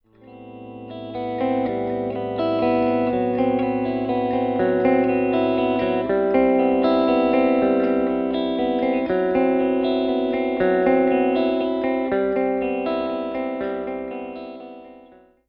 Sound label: LP MIDDLE LICK, humbucker pickup; patent denied humbucker pickup